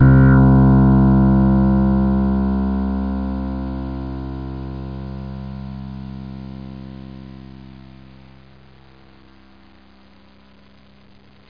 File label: synthesizer bass; fMSX